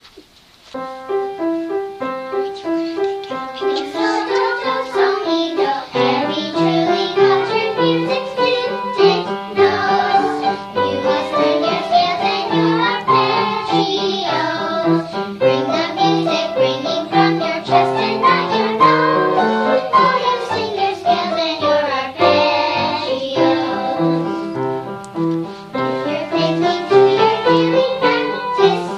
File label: Scales and Arpeggios